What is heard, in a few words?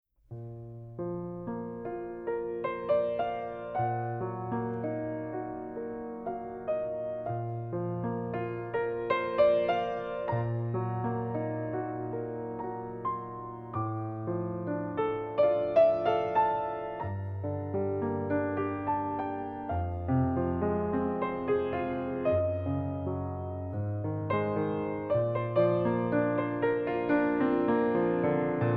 piano; spring